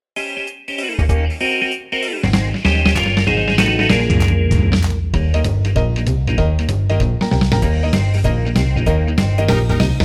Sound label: rock n roll